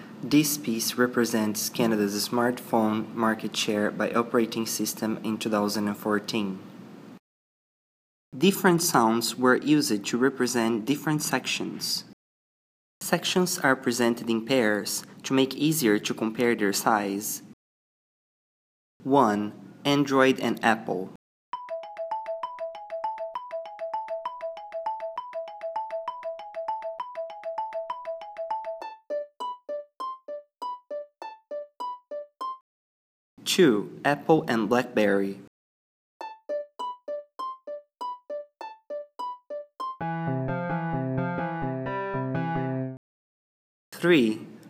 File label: Sonification